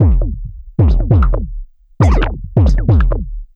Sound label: Drums Strange